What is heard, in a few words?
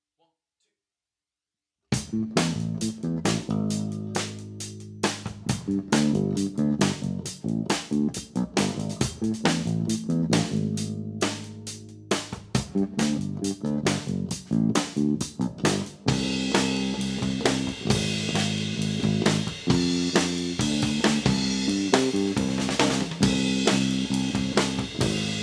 drums; Bass & Drums; Channel EQ’s